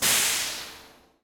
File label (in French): Capsulte Explose Gaz